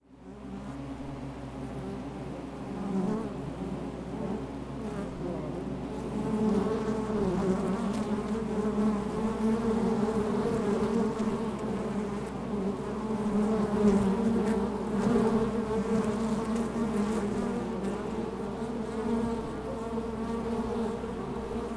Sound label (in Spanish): Zumbido de las abejas; msIBIAS